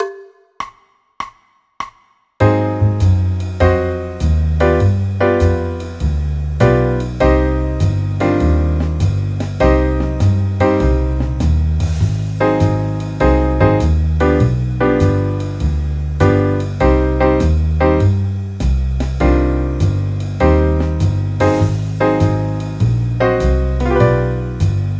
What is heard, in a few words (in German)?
c moll akkordverbindungen